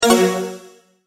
multimedia tone end

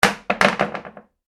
impact plastic chair fall over; Impact - Plastic Chair Falls Over Onto Hard Surface; Drops

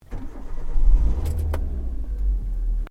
Startup Interior, car; Hyundai Accent 2008; Hyundai hire car; Vehicles

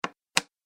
Slow Placement Of Coin On Table; Money Sound Effects

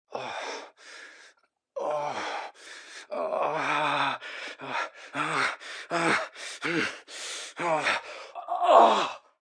Sex Buildup, Sex, male, heavy moaning, orgasm; (human voice); Groaning; VoiceBox

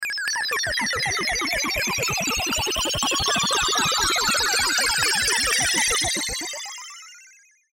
Sci Fi Digital Bleeps Ascend; Control Panel; Blastwave

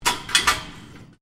Gate Supermarket Aisle Gate Close; Doors

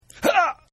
Grunt; Grunts; Voice; Partners In Rhyme

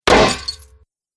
Club Whacks Chainmail; Battle Sound Effects

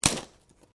Branches Dead Snap

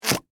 Suction Cup, Industry, Suction, Cup; Machinery; Machines

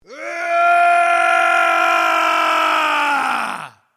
MALE ROAR AGGRESSIVE LONG; Yelling; Voice

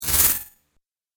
Robotic Motion, sci-fi robot sound effect; Robot Sound Effects; Andrew Fleming

